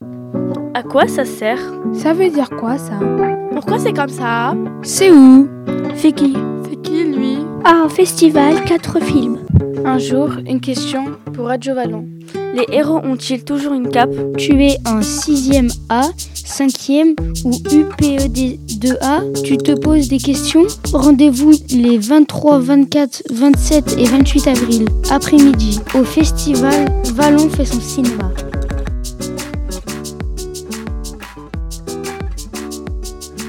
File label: jingle Festival